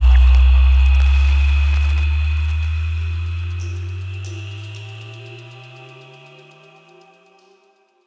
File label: Atmospheric; 99Sounds; The Weird Side Samples